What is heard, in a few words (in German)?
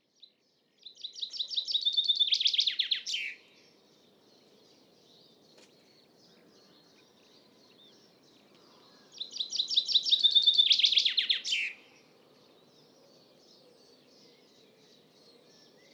Buchfink Fringilla coelebs, vogelstimmen